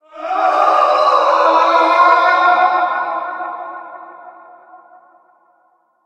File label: ghost scream